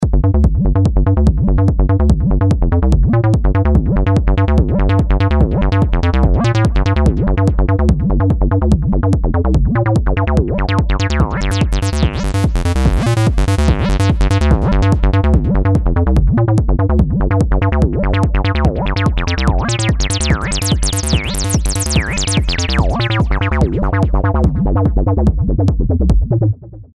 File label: Acid Bassline